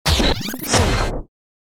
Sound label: Close the Door Backwards; DJ sounds